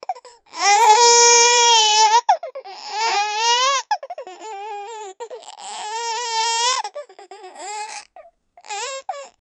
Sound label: baby crying